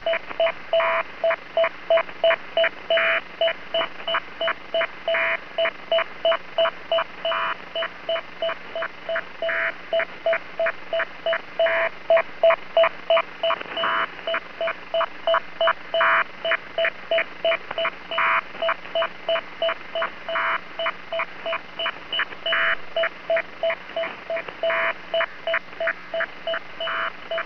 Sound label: Link11